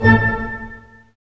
HIT STAC, Staccato Oct, Dynamic Orch; String Master Series

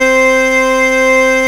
SIEL, String Machines

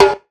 TIMB, Ragga & Dub Reggae; USB Soundscan vol.27; AKAI

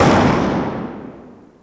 PISTOL; East West - Global Sounds

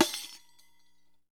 HI REQ; Deff Bendir &Req

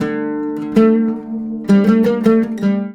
LUTH C, Arabian Traditions, LUTH RIFF; USB Soundscan vol.43; AKAI

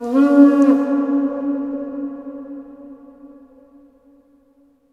HRNPHR, Heart of Africa; AMB. HRN FX

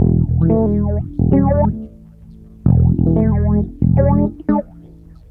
Bass Loop, Ambient Grooves

